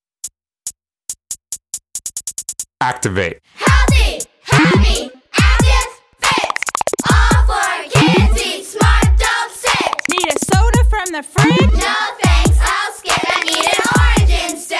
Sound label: Kids Chant